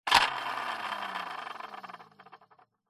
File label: leisure toy truck plastic wheel spin freewheel